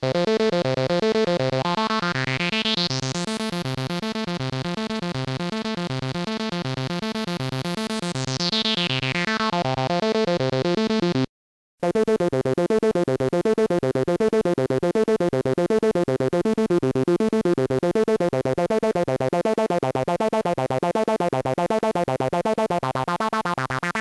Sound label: Roland TB-303